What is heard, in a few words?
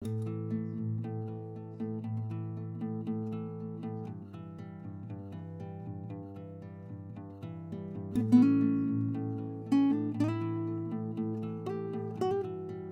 Mellow Guitar With Klicky Buttons